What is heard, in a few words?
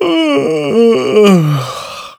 human yawn; theodyssey